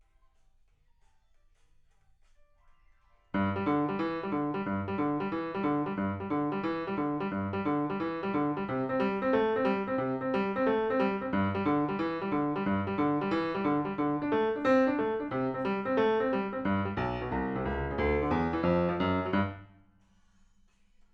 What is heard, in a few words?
PIANO